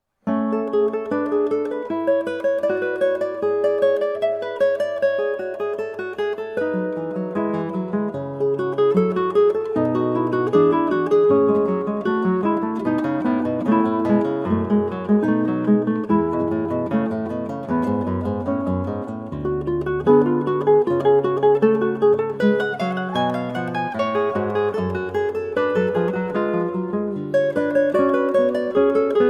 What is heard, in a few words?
Prelude 23 Book 1 Well-Tempered Clavier, 8 string guitar; BWV 868; JS Bach